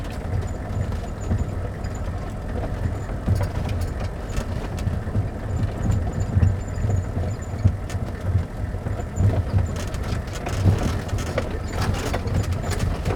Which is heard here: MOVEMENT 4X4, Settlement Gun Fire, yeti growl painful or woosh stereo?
MOVEMENT 4X4